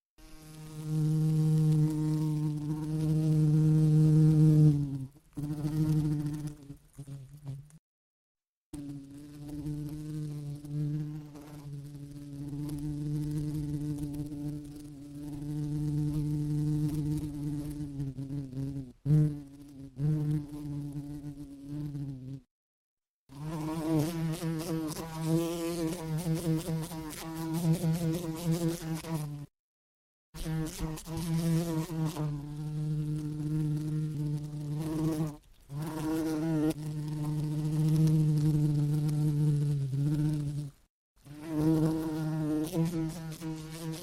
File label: Flying bee